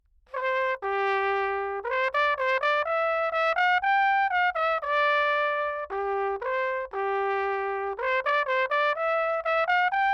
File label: natural baroque trumpet; 1780; Anechoic; OPENAIR